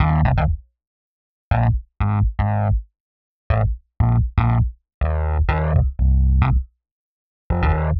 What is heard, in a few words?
Rhodes Bass C; PowerFX - Nu Jazz House